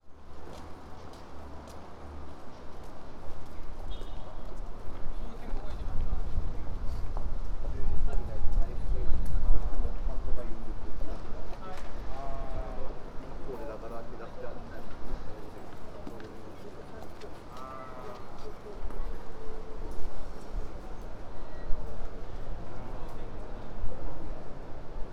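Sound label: extra news, fukushima station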